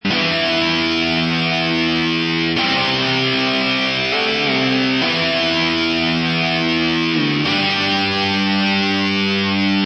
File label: Electric Guitar Chords Loop Em 98Bpm, Electric Guitar Loop In E Minor At 98Bpm; Guitar Loops